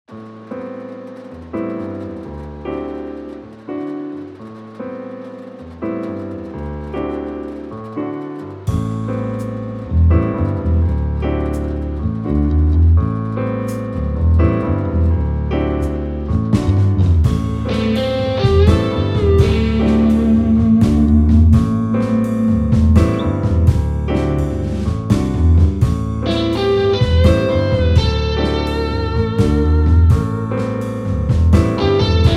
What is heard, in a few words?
Rain song